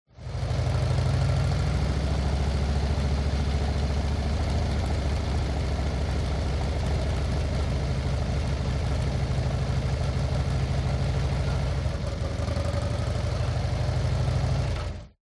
TRANSPORTATION MOTORCYCLE Harley Accelerate Decelerate Slow